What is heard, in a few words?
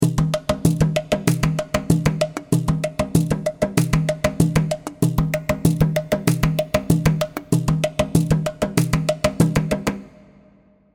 tiki percus; tiki percussion kit; tiki instruments; chiqui audio